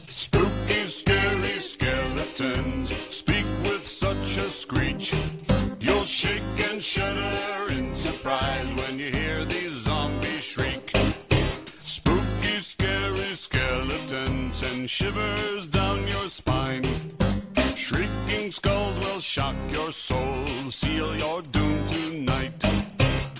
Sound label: spooky